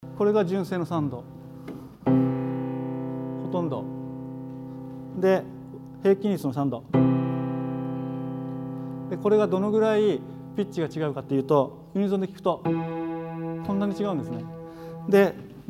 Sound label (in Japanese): 純正と平均律3度